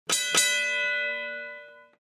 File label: Ding Ding